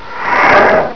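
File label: skeleton active; Nearby; Doom and Doom 2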